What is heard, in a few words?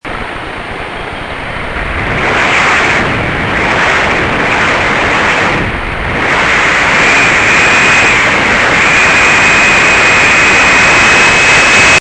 leak